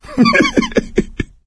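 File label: laughter, male; Left 4 Dead 2; coach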